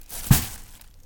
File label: put plastic bag